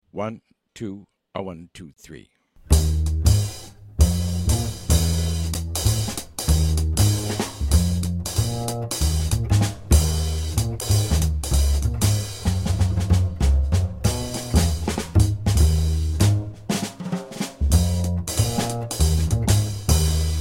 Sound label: minus Piano